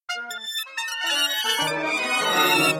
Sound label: metal noise; philippos